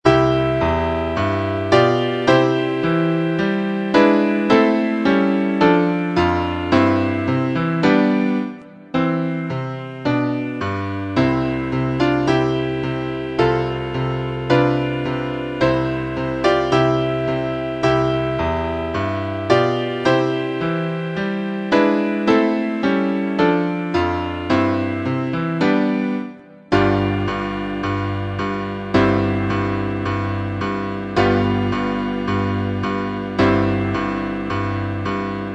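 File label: accompaniments, Trumpet in C; concone; Rudimentary Transposition Studies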